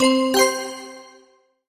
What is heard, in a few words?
hint